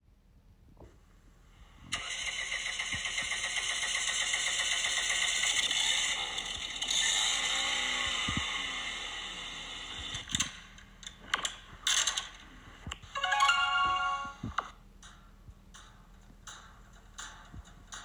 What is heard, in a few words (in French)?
Musique; le departement gmp compose par les etudiants